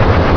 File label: fan; KATANA; Daikatana